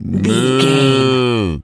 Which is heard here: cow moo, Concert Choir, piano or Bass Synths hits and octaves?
cow moo